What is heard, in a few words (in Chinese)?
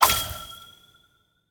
试炼刷怪笼生成物品声